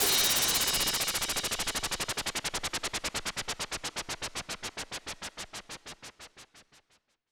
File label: Downlifter; FX; 99Sounds; Monosounds